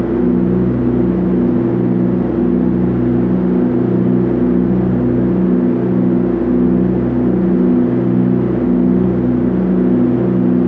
distance airplane heavy idle; Hearts of Iron IV Death or Dishonor

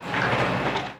construction; Hearts of Iron IV Death or Dishonor

